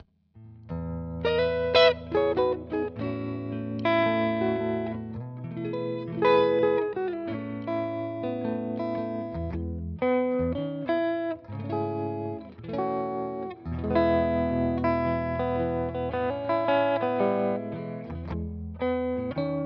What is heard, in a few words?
guitar